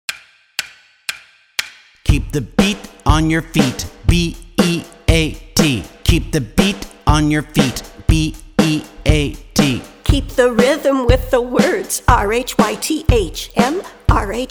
Keep the Beat vocal

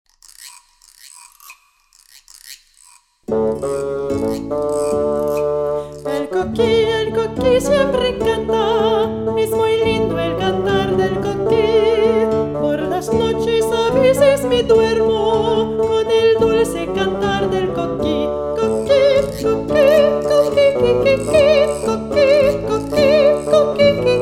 El Coqui vocal

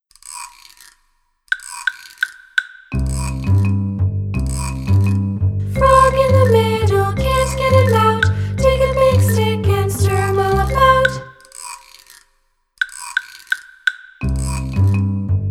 vocal

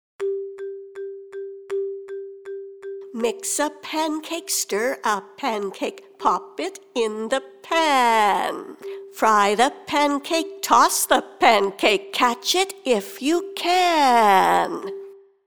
vocal

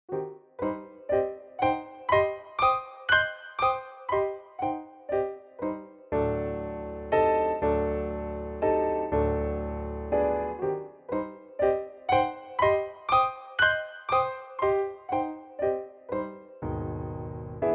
Hopping

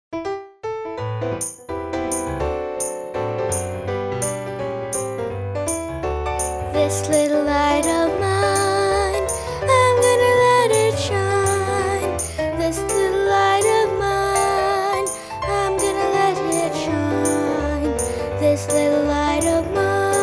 This Little Light vocal

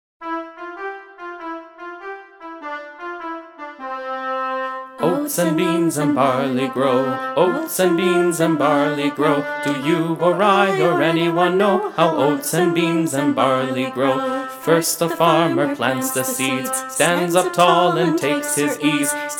Oats and Beans and Barley vocal

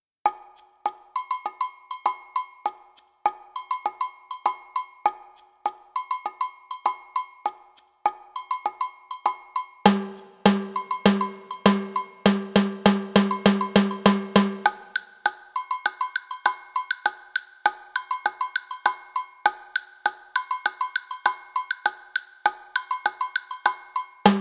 instrumental

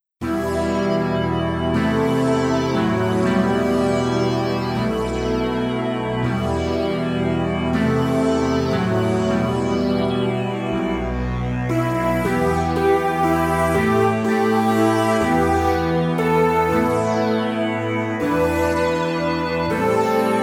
Scary

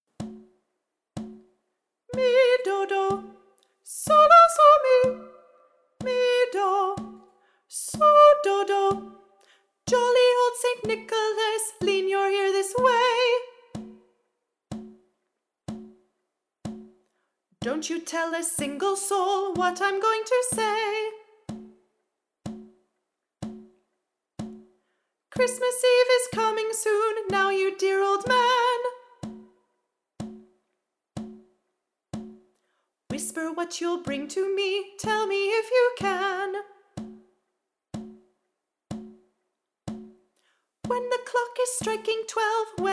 Jolly Old St Nicholas echo

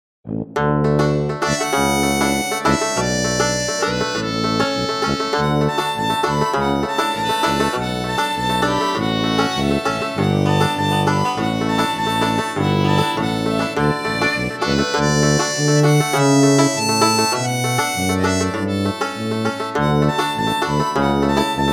Great Big House instr

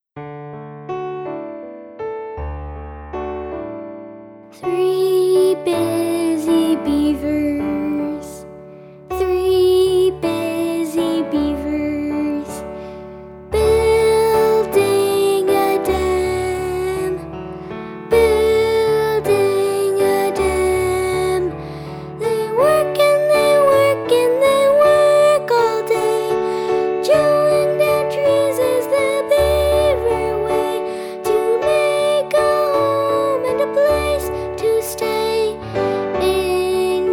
andante

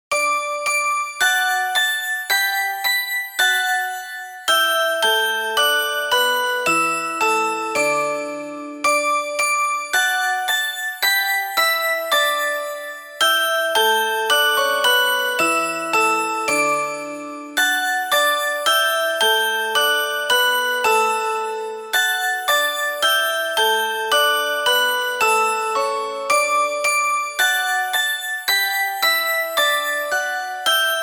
Twinkle Twinkle Little Star

